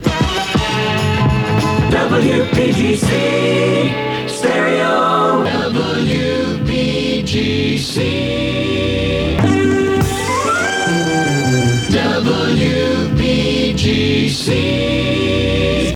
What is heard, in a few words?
jingles resings montage; wpgc